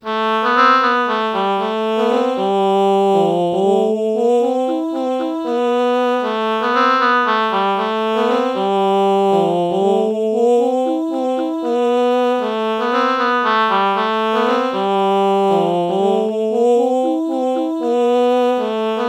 oh oboe melody morph low

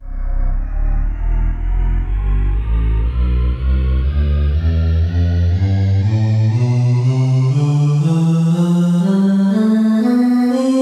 synth voice; tonleiter

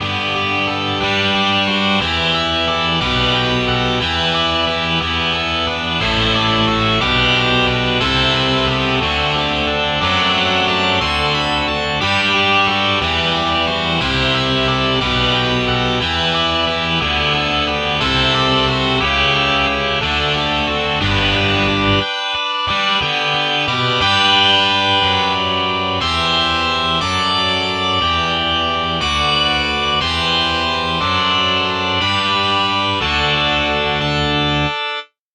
distortion guitar

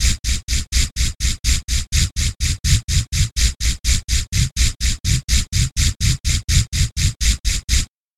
breath noise bass

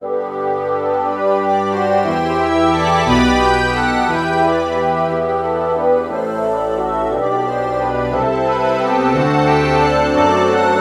bassoon reed organ true bach morph

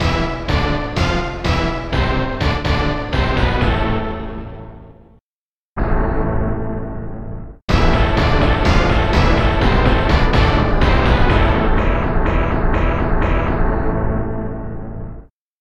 orchestra hit samples

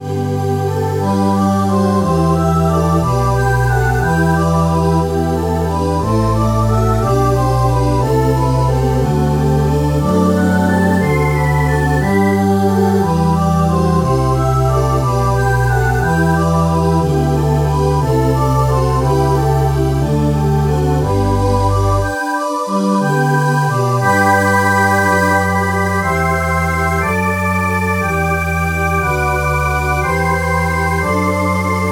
synth voice

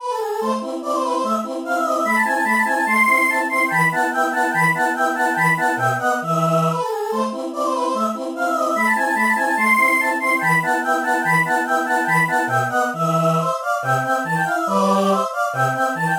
echo drops mozart

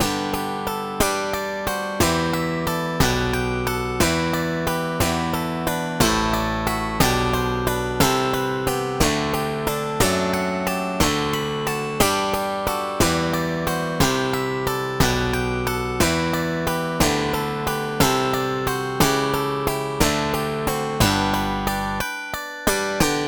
pop bass

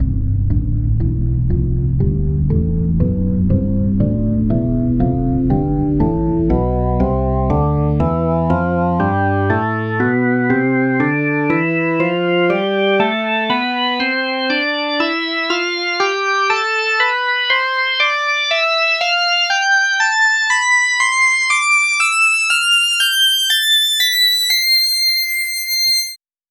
rock organ; tonleiter

